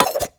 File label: atk axe throw